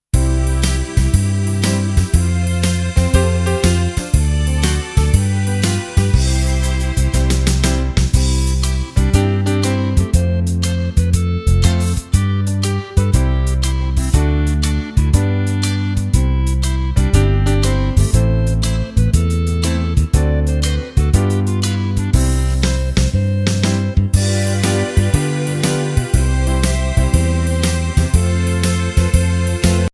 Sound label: click go shears K=C; AUSTRALIAN